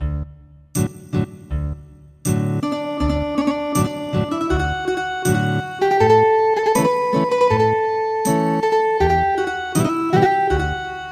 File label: Ethnic Guitar